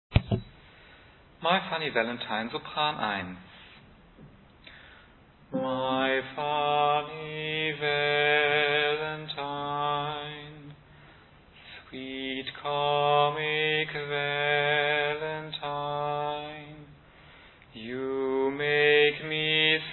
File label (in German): Sopran